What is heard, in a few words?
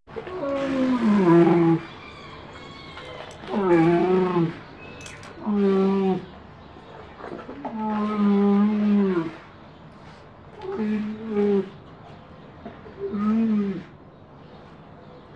Miscellaneous growling sounds